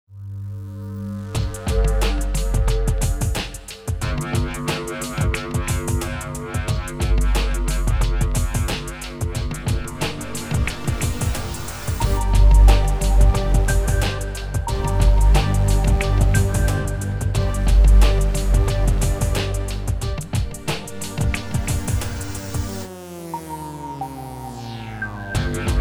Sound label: Nasty Dub